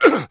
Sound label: male pain; firefight